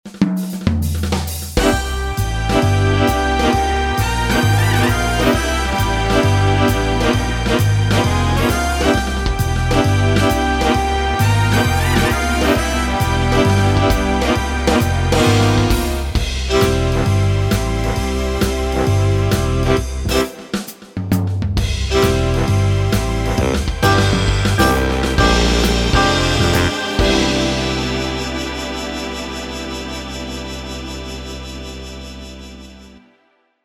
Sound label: Orch Big Band; wersi